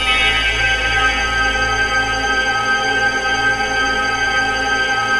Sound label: ATMOPAD; Best Service; XX Large; Pads